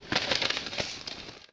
sabong; anito; animals

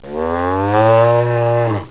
MOO

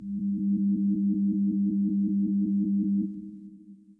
Computer Telemetry; Hidden Evil

